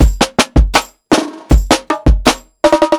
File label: PICCOLO 80 BONGO, drums; Basement drums vol 2